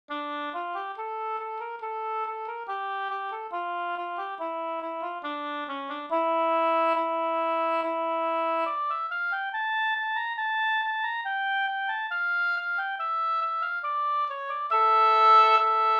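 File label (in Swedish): brudmarsch Brudeslatten fr Sogn, Norway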